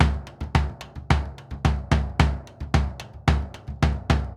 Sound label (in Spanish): Bombo Murga 110, Drums & Percussion, Latina; Best Service; Festa Latina VOL-1